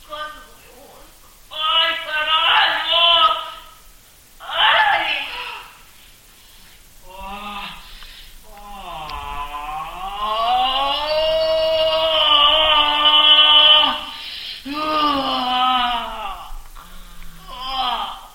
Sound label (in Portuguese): sirene